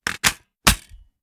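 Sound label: reload empty mag in, shotgun; gmod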